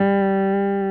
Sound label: STICK, GUITAR FX